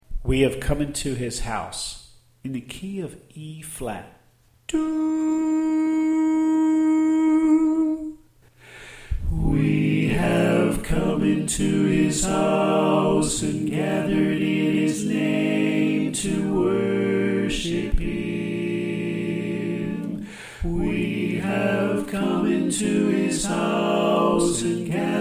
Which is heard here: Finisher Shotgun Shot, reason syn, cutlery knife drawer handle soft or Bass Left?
Bass Left